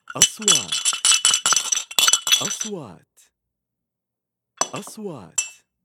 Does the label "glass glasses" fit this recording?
Yes